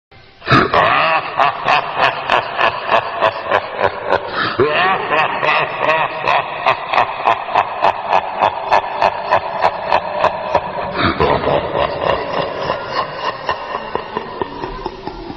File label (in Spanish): Risa Macabra LOQUENDO de terror